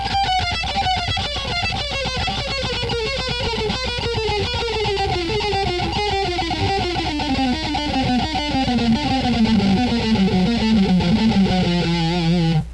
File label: chromatic